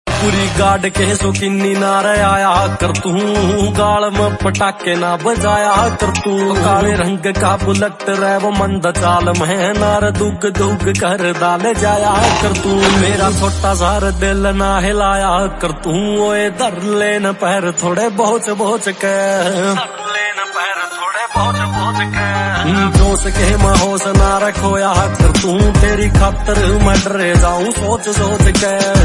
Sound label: Song Ringtone